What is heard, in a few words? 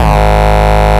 Bass, Root G#; Vengeance Samples